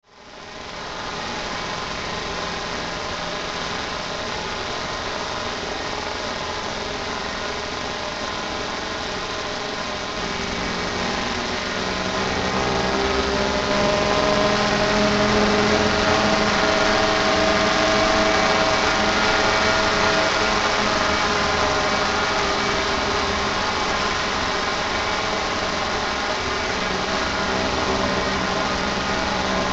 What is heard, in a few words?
DRONE DIGITAL, Drone Digital Distortion Static Swells; Tech; Blastwave